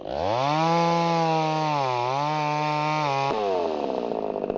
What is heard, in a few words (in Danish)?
Maskine